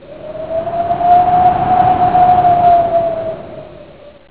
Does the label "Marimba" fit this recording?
No